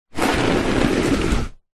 foley luggage suitcase leather laying on side drag push hard ground medium